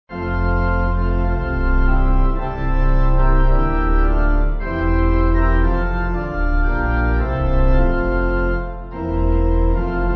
Pipe

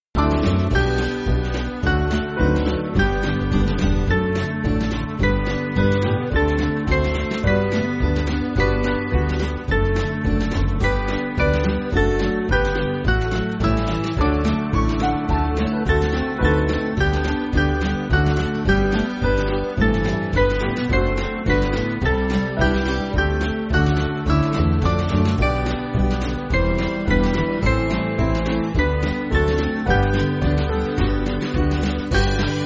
Band, Backing Files for Churches

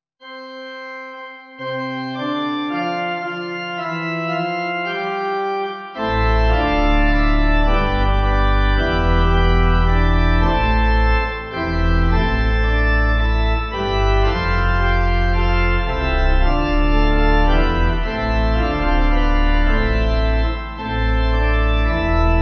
Pipe, Pipe Organ; Communion Service (1940/1982 Hymnal-USA)

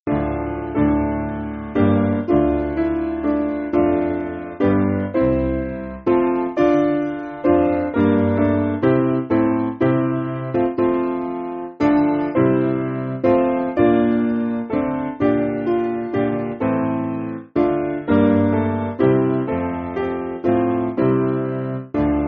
Piano

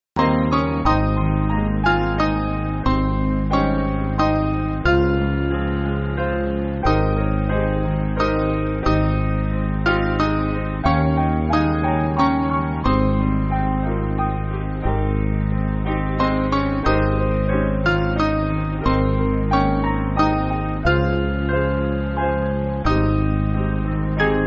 Piano, Free Music Backing Files for Churches

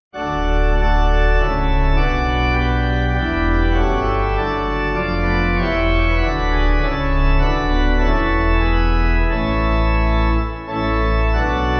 Pipe

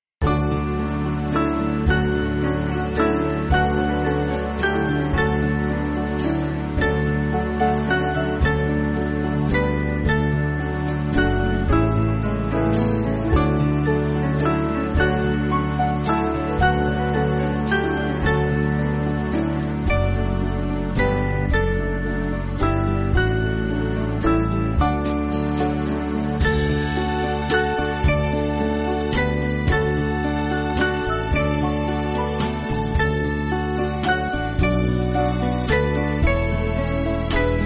Band, Backing Files for Churches; Watchman Tell

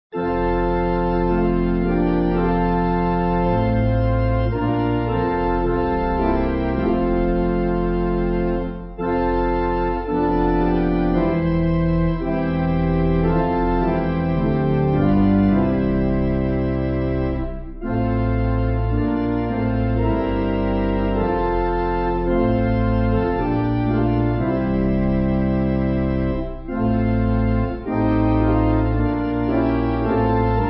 Pipe; Teach Me My Lord

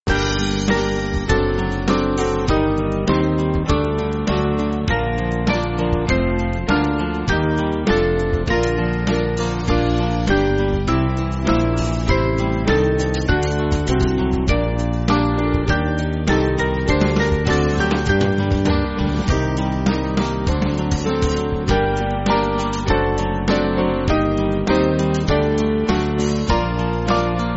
Band

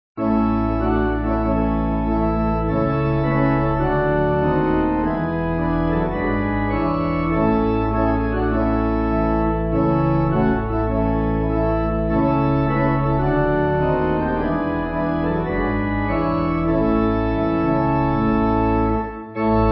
Pipe; Come To The Savior Make; G.F. Root

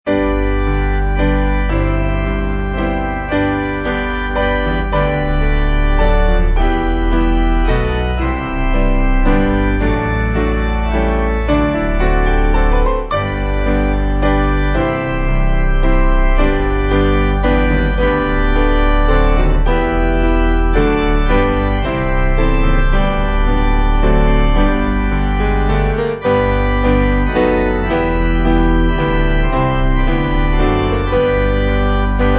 Piano Organ; What A Friend; Blaenwern; What a Friend we have in Jesus, all our sins and griefs to bear!

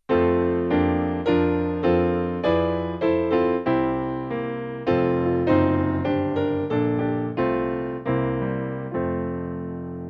Depth Of Mercy Piano

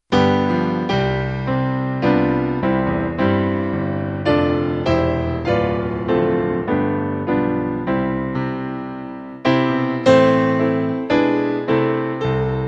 When Peace Like A River, Piano

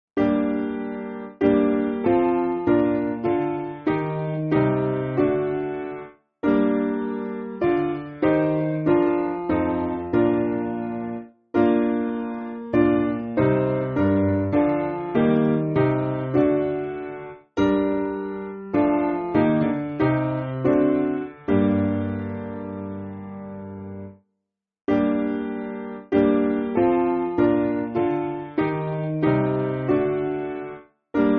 Piano; My God How Wonderful; Windsor

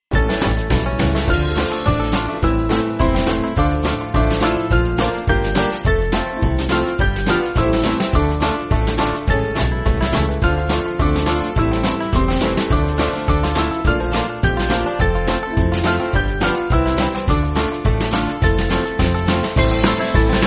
Band, Free Music Backing Files for Churches; When Stephen Full; Salvation

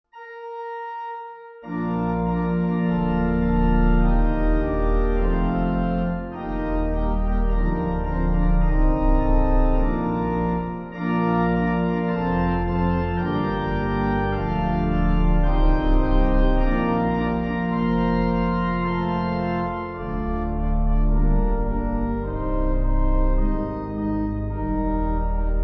Pipe

